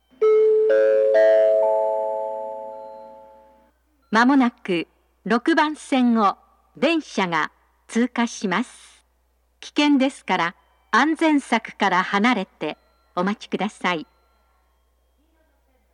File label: pass, station; atami